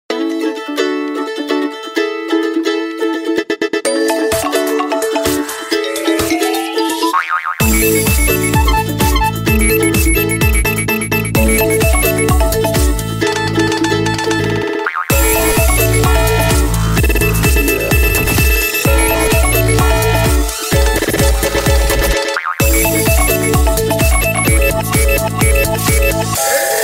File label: iPhone Instrumental